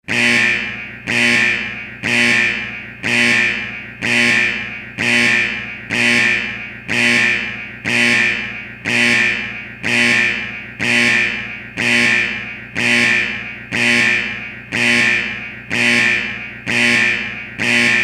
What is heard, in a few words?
halloween theme song remix